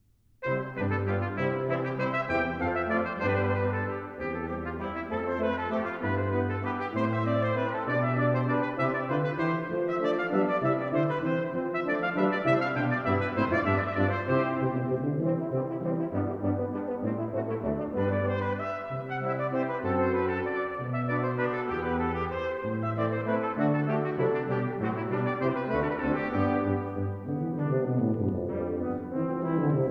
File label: Sonata In C For Trumpet & Strings, Vivace, Brass Quintet; Baroque Brass